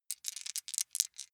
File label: small three rattle in hand short, wuerfel